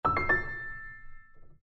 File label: message, notifications